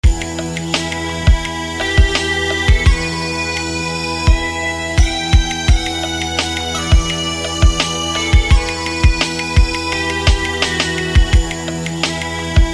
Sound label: Uilleann; Uilleann Flare